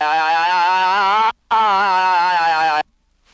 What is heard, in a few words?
"ah" long up down; ooh ahh